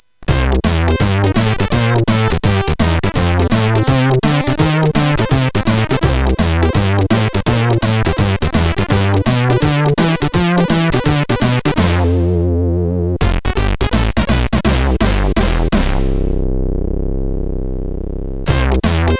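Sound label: jazz jingle, sid CSG8580R5; hvsc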